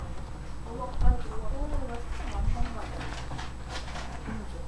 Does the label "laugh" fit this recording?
Yes